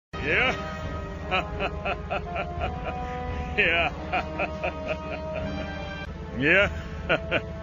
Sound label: yahaha; enel